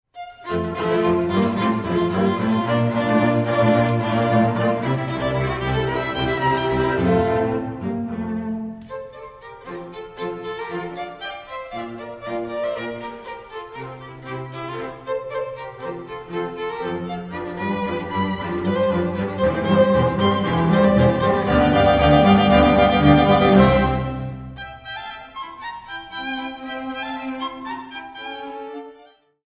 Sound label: String Chamber